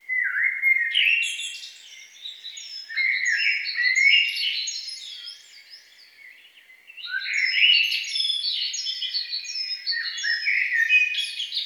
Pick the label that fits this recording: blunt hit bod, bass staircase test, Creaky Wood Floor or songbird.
songbird